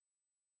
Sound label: Gauss Filt B7